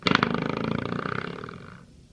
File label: door stopper spring